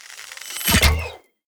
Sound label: skill armor Piercer; tower royal Archers